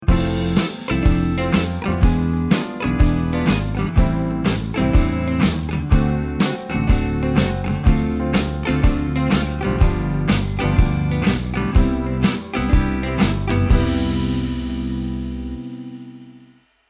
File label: tension prog